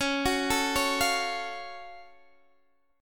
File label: Gb Minor Major 7th Db